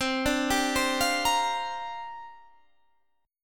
C 7th Suspended 2nd Suspended 4th C down Guitar Half Step Down